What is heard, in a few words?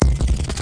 down test